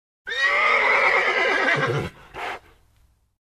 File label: Horse Bray with breathing